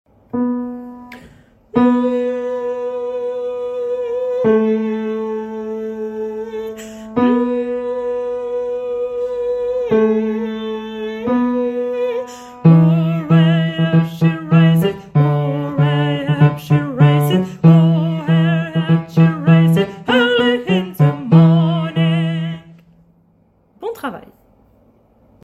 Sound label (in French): baryton; What shall we do